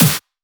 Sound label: Snares; Vengeance Samples